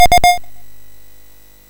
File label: Dragons Den, MOS6581R3